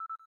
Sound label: cam timer last; G5